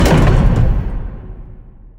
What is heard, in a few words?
docking airlock; ships; CosmicRage